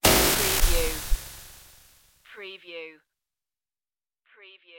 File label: futuristic bullet impact